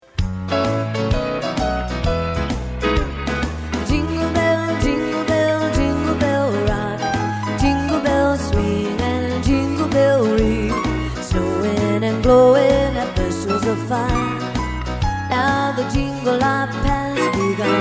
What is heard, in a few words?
jingle